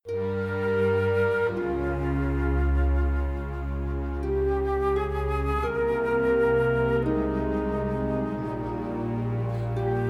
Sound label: SCENE CHANGE; Beauty and the Beast